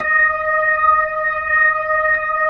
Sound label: B-3 Jazz D#6; Keyboards of The 60's and 70's